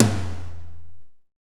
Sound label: KOMMERZDRUMS